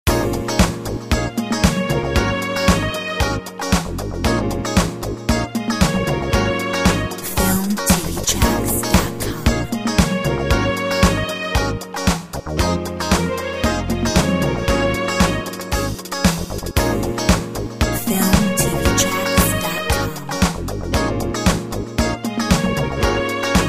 Disco lips

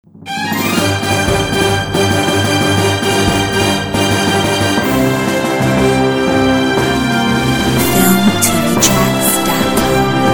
Western Plains 140

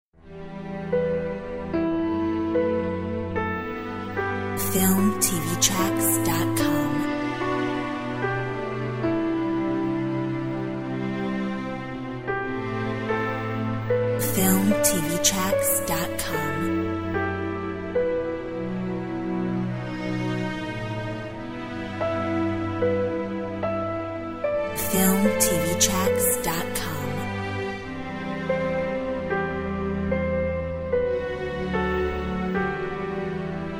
Eternal regret piano

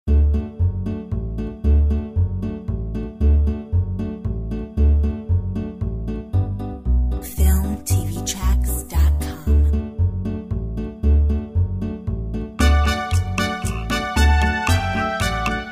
Viva El Mariachi

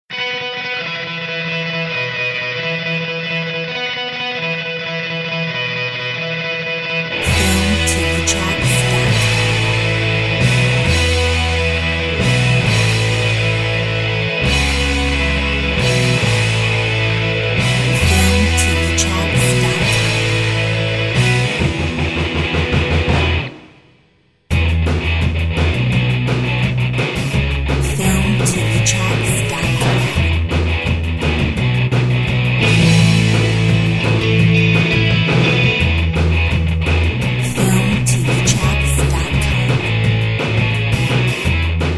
Rock groove; Filmtv-tracks

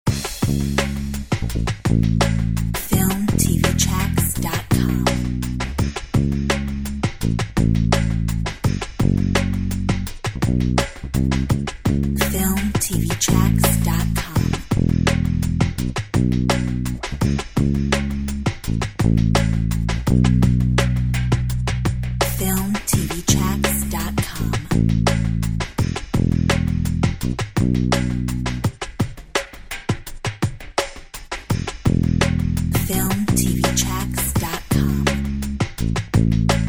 Drum bass; Filmtv-tracks

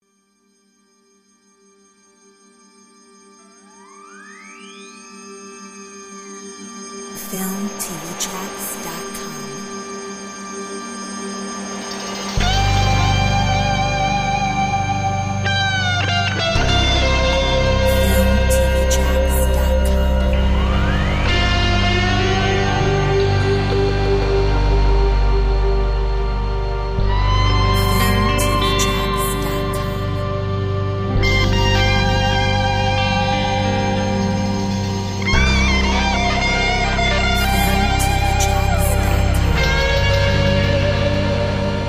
Progressive rock; Filmtv-tracks

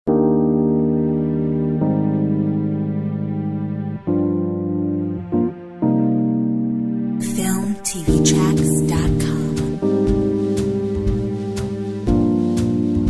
Mellow jazz

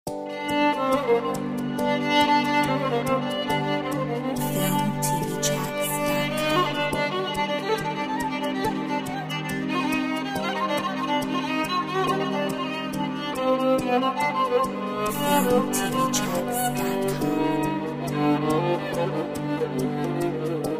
Middle eastern Violin; Filmtv-tracks

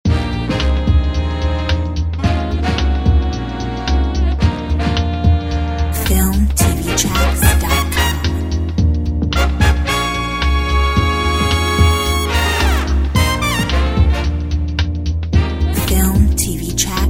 swing

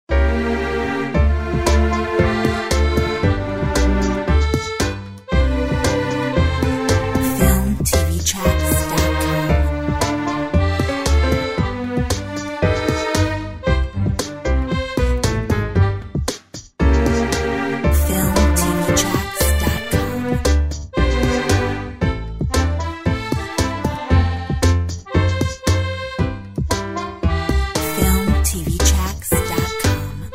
Tango

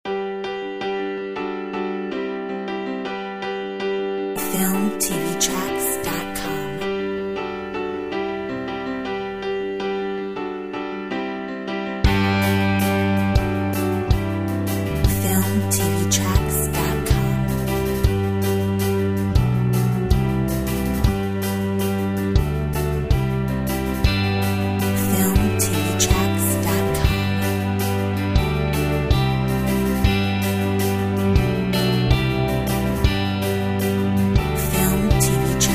Uplifting piano; Filmtv-tracks

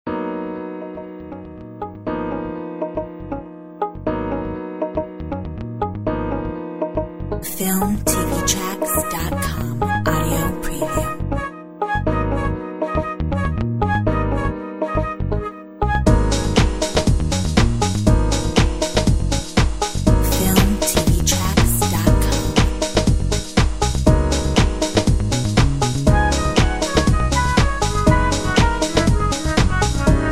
Jazz

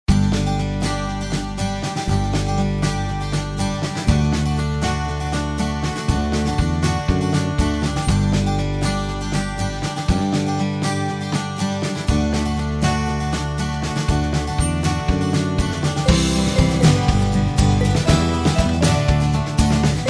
Happy folk

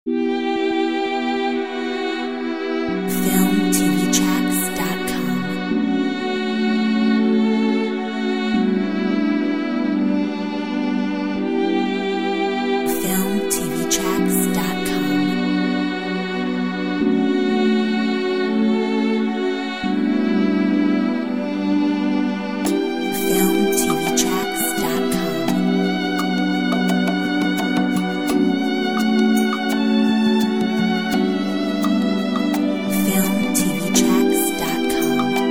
Tearful violin

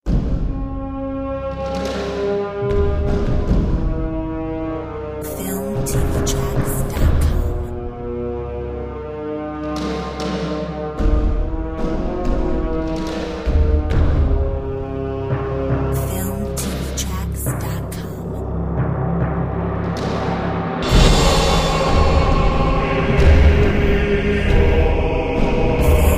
heroic

